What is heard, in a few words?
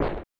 Powp; Super Smash Bros